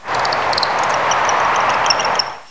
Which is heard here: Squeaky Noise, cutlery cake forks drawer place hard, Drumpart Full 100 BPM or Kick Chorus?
Squeaky Noise